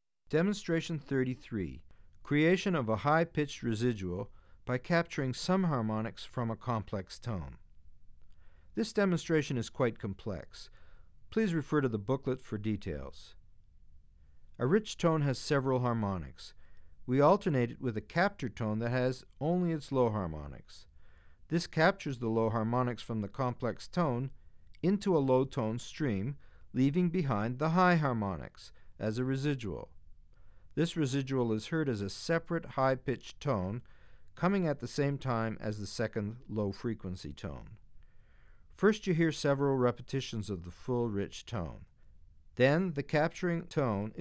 Creation Of A High-Pitched Residual By Capturing Some Harmonics From A Complex Tone; Bregman ASA; auditory demonstrations